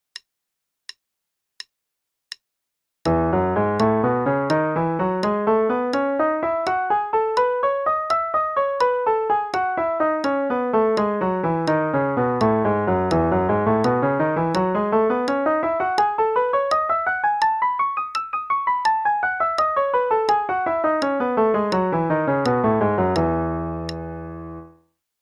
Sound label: E Major, triplets then 16ths (qn=84); CM Level 6